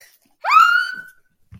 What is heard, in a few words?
hum yell